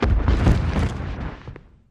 Dragon Falls, dinosaurs

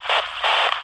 Multiple two-way radio squelches and feedback.; Squelches, Radio Telemetry, Radio Static

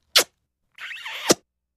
Kiss; kissing

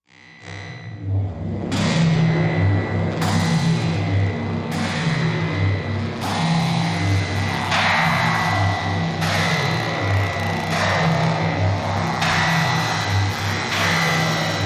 Phobia Winch Machine, sci-fi ambience

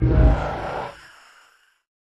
Creature Growl

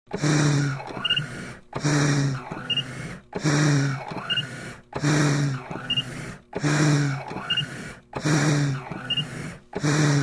Ventilator Hum Run H, respirator

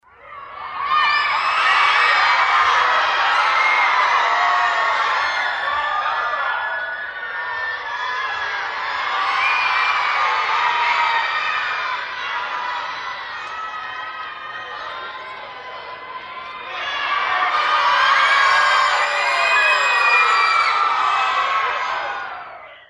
Theater Crowd Scream

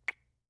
Soft Kisses; Soft Light Kisses. - Fleshy Kisses

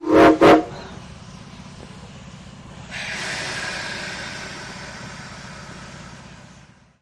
Train Horn Freight

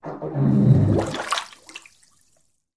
Bathtub Fart Loud; farts

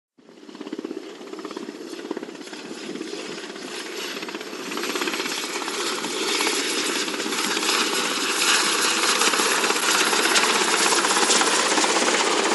Heli Trffc Apprch Lan, traffic

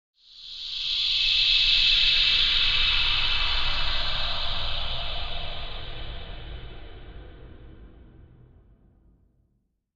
Wash HF Elect Buzz Wash

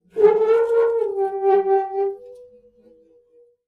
screech, squeaks

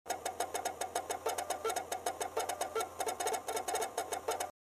Fast Whir Access Disk, computers

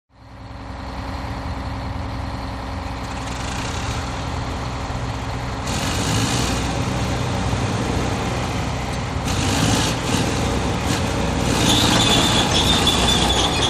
Digger At Work, earthmover

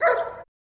Shepherd Dog Bark Out

